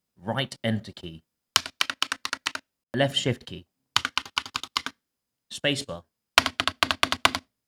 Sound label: Linear Optical Switch